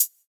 clear hat sample g key, Royality free hi hat one shot tuned to the G note. Loudest frequency: 10816Hz